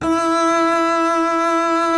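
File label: strings contrabass E; Miroslav Vitous; Solo Instruments VOL-2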